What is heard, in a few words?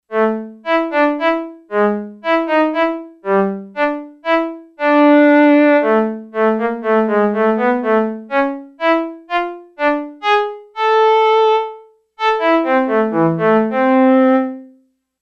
Cello 2, Yamaha DX11; Factory Preset Voices